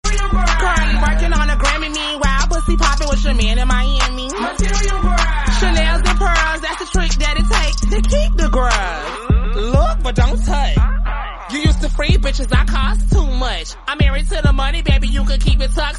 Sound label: english song, remix